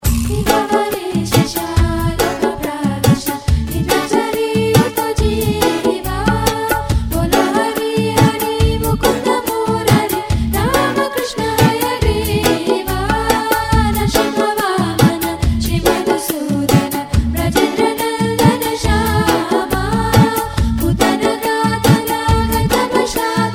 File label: Melody; Vibhavari Sesa